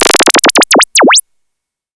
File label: tuning